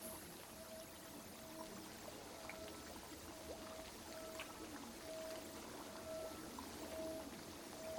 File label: Amb Labo; Puddle